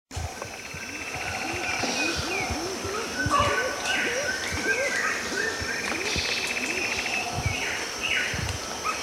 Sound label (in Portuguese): garça-real